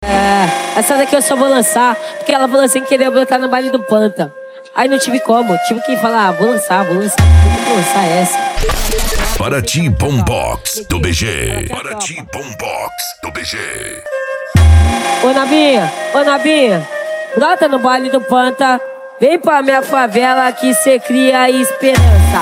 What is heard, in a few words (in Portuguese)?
bombox funk